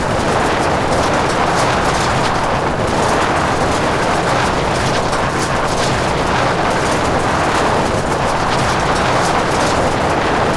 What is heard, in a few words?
road stones ext, Kart Tires; AOS RF1 Kart Demo